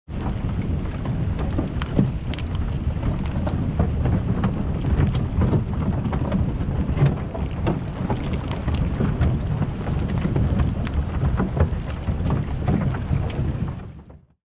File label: Polar ice, یخ های قطبی